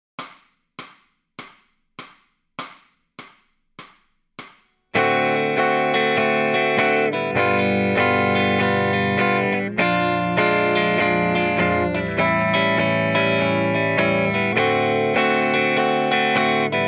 Lead Backing Track, electric guitar; Grade 5

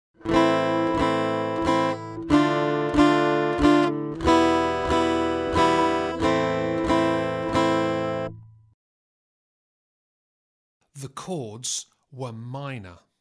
Aural Harmony Test, acoustic guitar; Grade 1

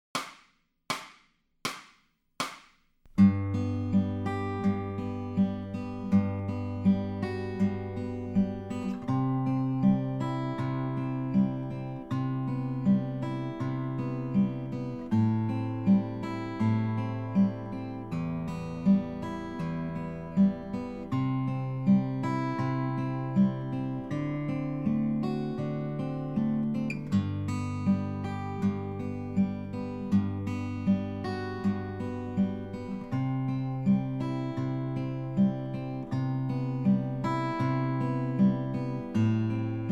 Fingerstyle, acoustic guitar; Grade 2

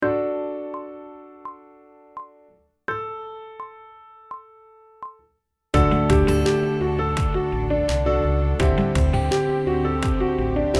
aural tests harm of a melodic phrase; Grade 8; pop vocals

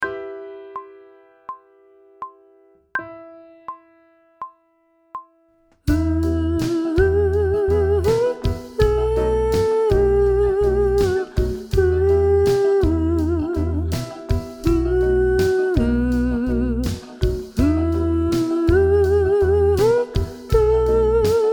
Sight Singing with vocal, pop vocals; Grade 3

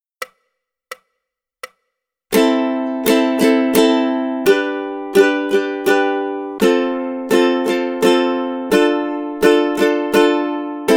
Rhythm Study, ukulele

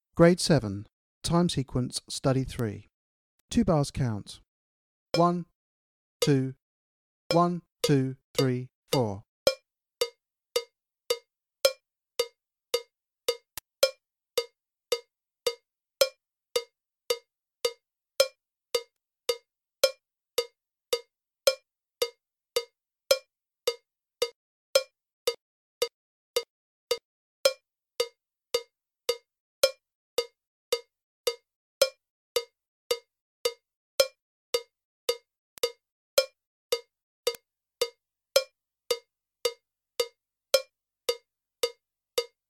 Click Track for Time Sequence Study, drum kit

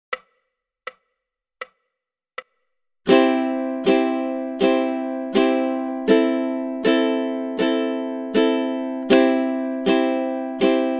Rhythm Study, ukulele

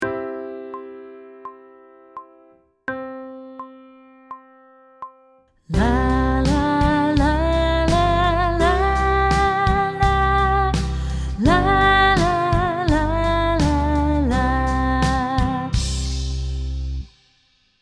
Sight Singing with vocal, pop vocals; Grade 1

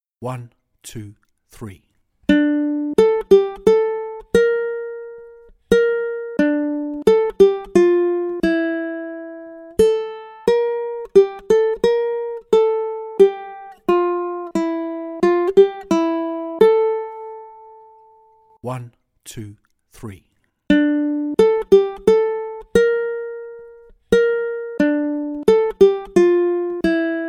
Accompaniment, ukulele; grade1

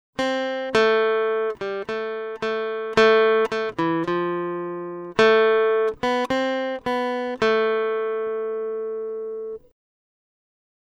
Aural Rhythm Test, acoustic guitar; Grade 3

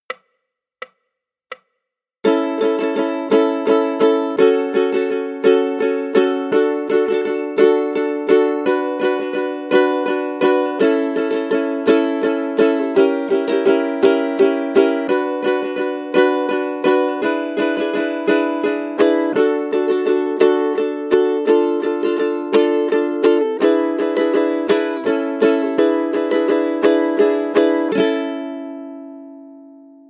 rhythm study, ukulele; grade3

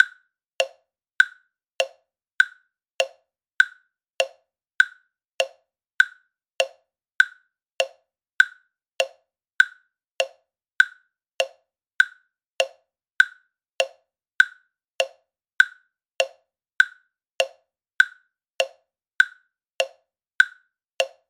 Click Study Agogo Rio, drum kit

